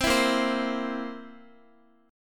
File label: B flat Suspended 2nd Flat 5th chord, down, Guitar; strummed